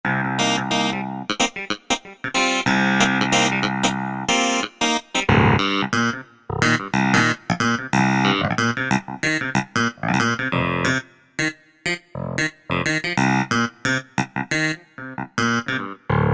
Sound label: Clavi, Yamaha DJX; Voices